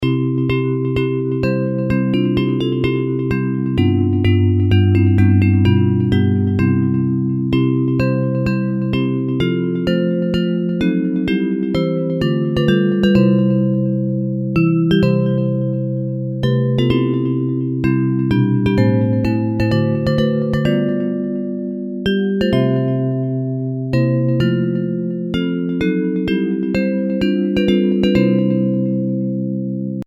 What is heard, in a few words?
Bells